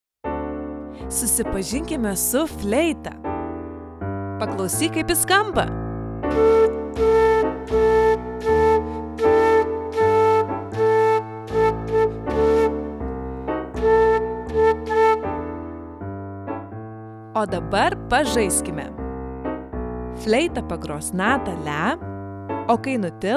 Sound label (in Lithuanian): Fleita; Susipazink su instrumentu